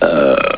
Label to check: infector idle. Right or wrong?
Wrong